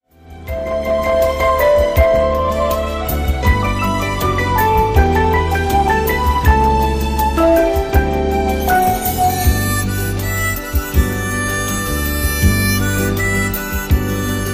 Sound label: soft and smooth tone